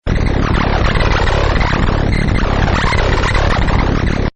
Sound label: engine trouble; Button Sound Effects; Multimedia